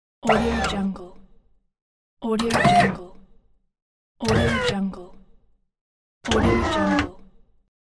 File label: hydraulic press